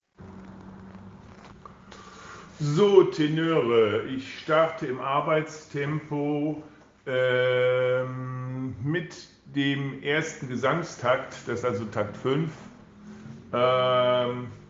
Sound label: Tenor a tempo